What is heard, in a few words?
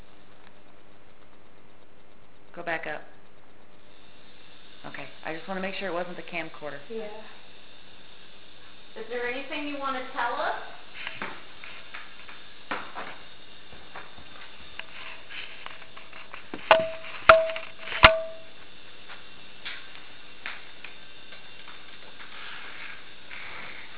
high emf spike in dining room then gone